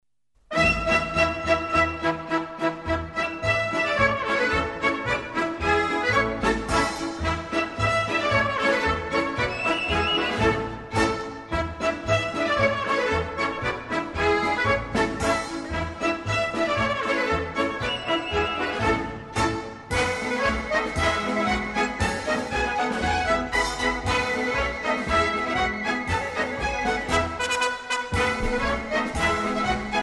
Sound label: The Laughing Polka